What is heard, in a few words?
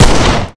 fire, glock; Urban-Coalition; Decay; arccw ud